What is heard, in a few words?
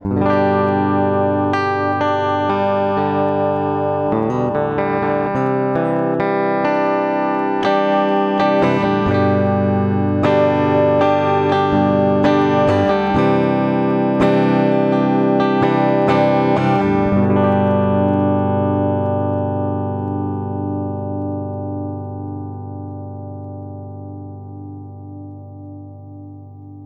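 clean both pu, guitar; Guild Surfliner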